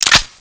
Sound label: handgun clip in, weapons; svencoop